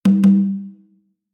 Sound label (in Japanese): bamenntennkann